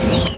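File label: door close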